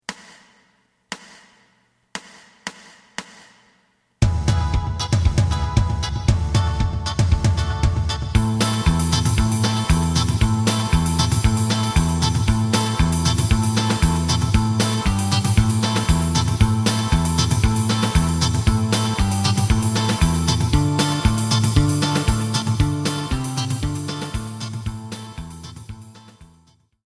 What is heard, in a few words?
lead